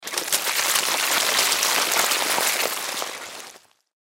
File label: Body Reanimate, Body Reanimate Juicy Squishes Short; Household Sponge Squish Squeeze Water Drips Paper Towel; Horror; Blastwave